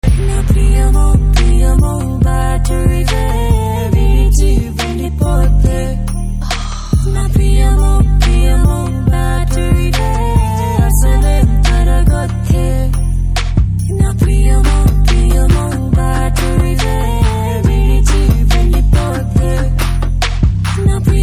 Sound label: BG Tone Telugu Ringtone